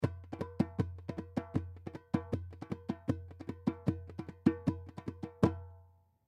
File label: Goombay Drum Rhythm; Goombay Drum Rhythm Roll Fast Short; Drum Sound Effects